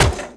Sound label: chair metal impact